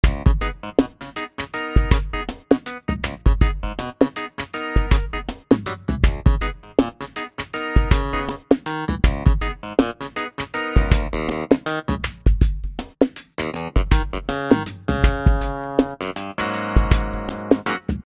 e7, electrik piano; native instruments

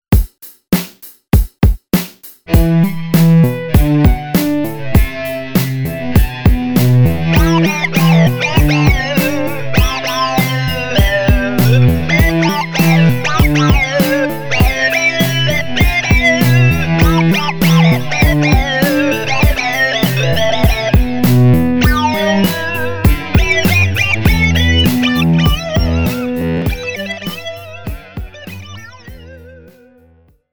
filter arp; m-audio; blackbox

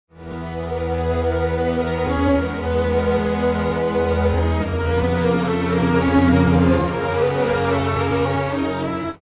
2, strings, pitchshift; usb uvi workstation

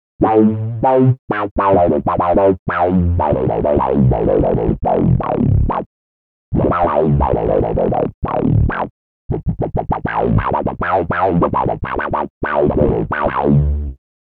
funk lead; gforce vsm

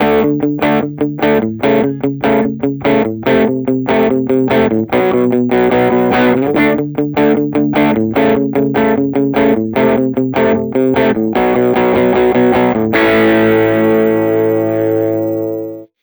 crunch bridge gibson; vintage

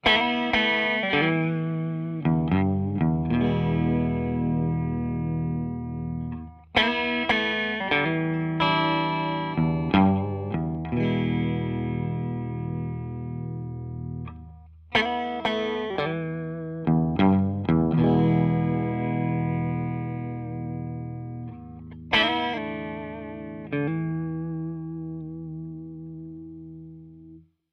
reverb; tc electronic; nova

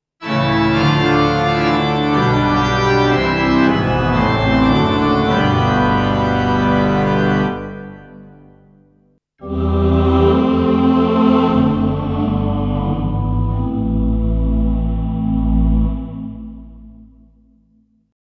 church; kurzweil pc361

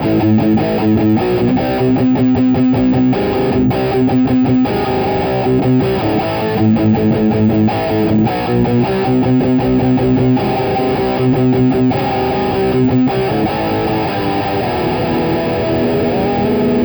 bridge ultra distorded, gibson sg; sg goddess

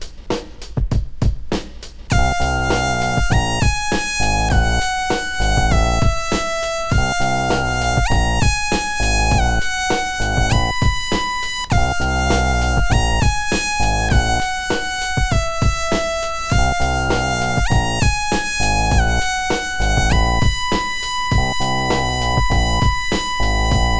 westcoast; wayoutware timewarp 2600

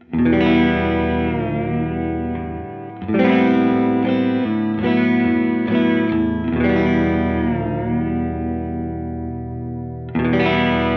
room modes, reverb; tc electronic nova

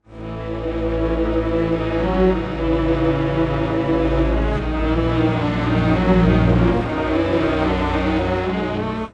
5, strings, pitchshift; prosoniq time factory dirac

